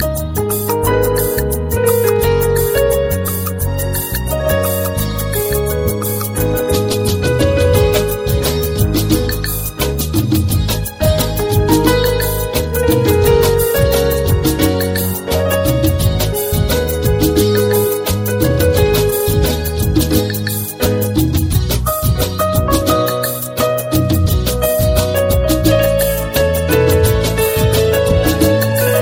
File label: sad Ringtone; زنگ موبایل ساده و شیک